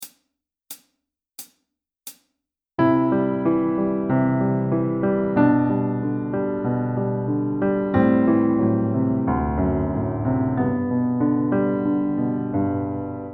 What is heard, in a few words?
modulating Prinner